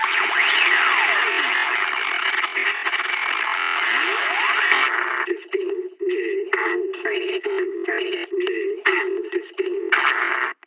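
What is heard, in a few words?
intro radio cutoff; music; c17; hlvr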